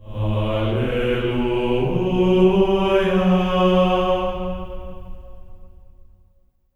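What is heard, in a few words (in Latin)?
alleluia B3; Spectrasonics; Symphony of Voices; GR ALLELU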